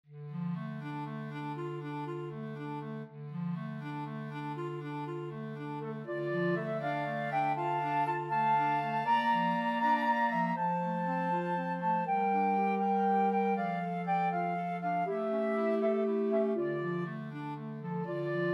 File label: flexible mixed ensemble, 4 players